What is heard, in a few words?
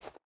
footstep burlap snow jump start; Rust